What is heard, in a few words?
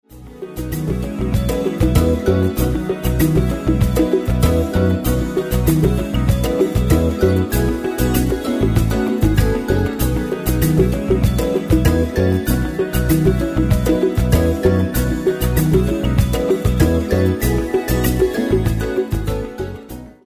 International Travel Wide